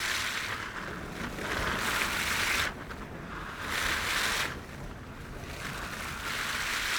mud tire loop

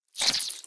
vibe

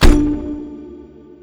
cello

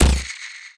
harp bow

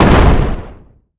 explosion large no tail

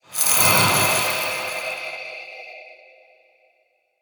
atk aoe heal

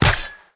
atk sea sword

